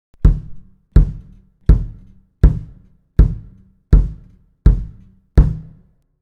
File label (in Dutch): drumonderdelen